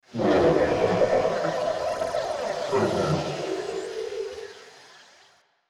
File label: alien nature; Stellaris